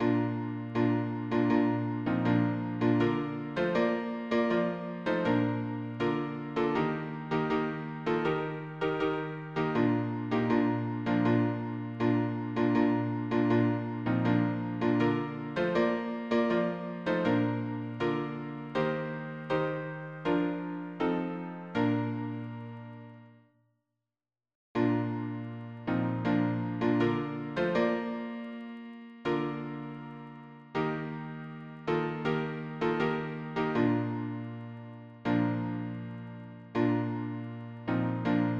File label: harmony piano